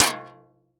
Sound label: Impact Metal Impact Metal Sign Hit; Impacts